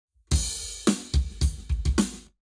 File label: Drums, 0deg; Lab Wet